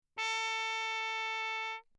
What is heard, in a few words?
TRP MUTE Bach; D Wick; Perinétventiltrompete; Mutes